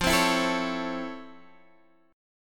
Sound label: F Major 7th Flat 6th, F, guitar